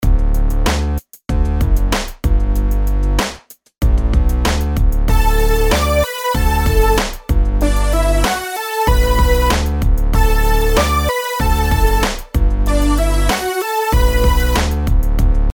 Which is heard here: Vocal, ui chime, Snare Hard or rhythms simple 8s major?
rhythms simple 8s major